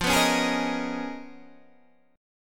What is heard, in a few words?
C sharp 13th F